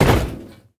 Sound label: metal land; Golden Crayon Sounds